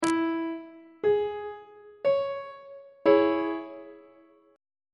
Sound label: c sharp minor 1st inversion on treble clef